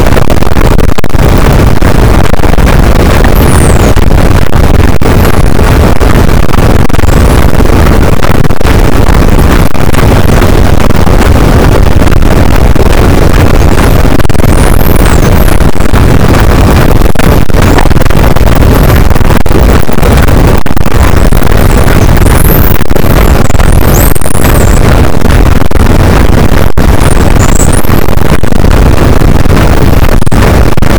cachalot negatif, boat, Physeter Macrocephalus

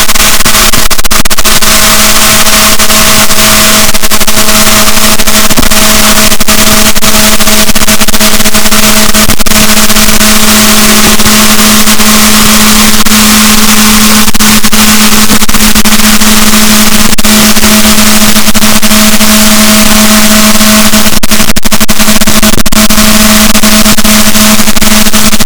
rorquals negatif, Balaenoptera Physalus

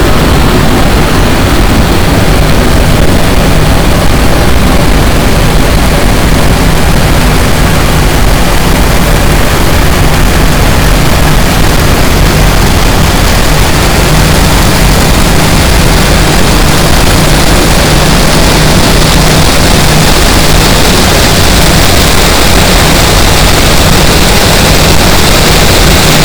cachalot, boat; Physeter Macrocephalus

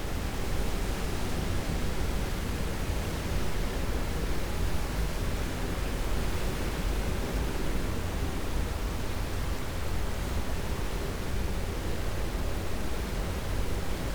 cachalot negatif, Physeter Macrocephalus

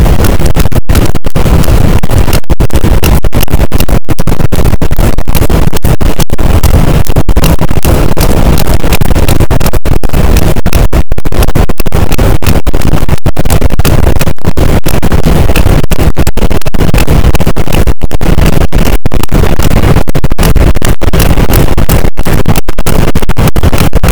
cachalot positif, boat; Physeter Macrocephalus

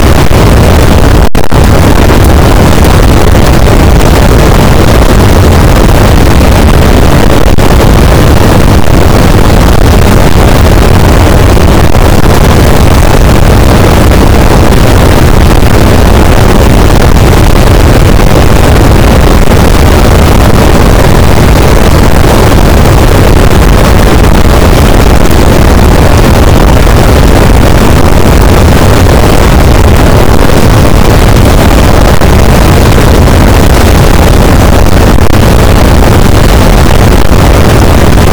cachalot positif, boat; Physeter Macrocephalus

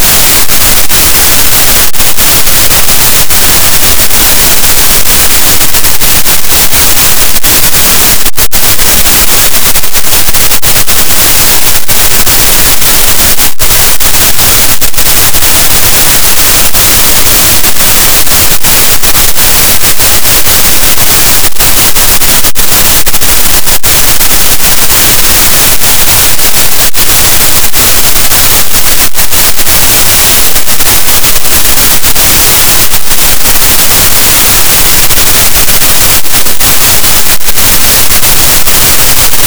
cachalot, gaussian noise; Physeter Macrocephalus

